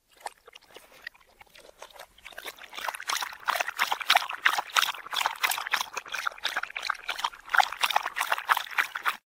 schlop; reverse

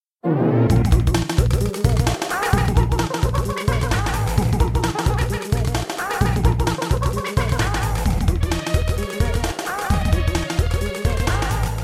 stage clear diddy; cave

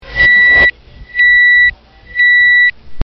oven timer; reverse

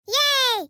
yay

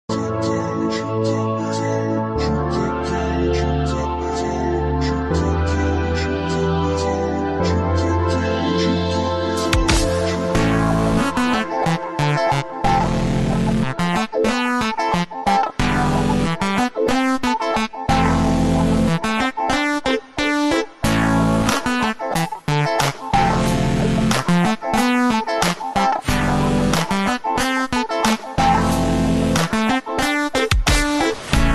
funky piano; reversevape

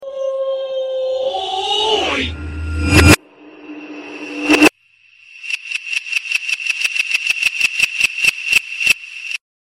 yoooooo; reverse

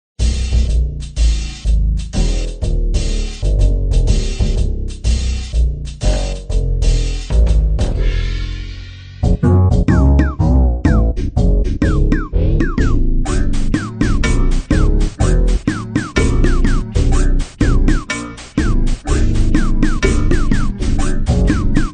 dog bass

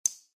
switch

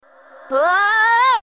despair

